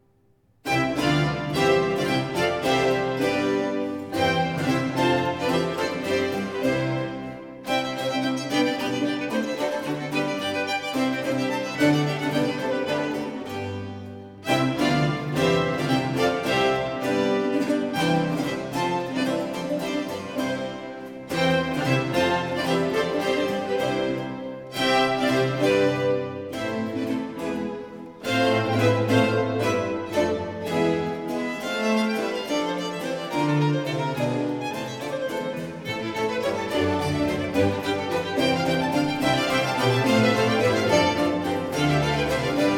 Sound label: Concerto 7 in D major 5. Vivace; Arcangelo Corelli; Corelli - Complete Works; Klassika